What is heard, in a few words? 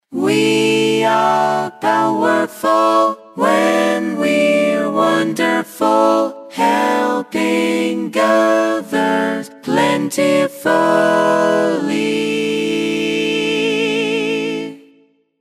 Choral 4 Part Harmony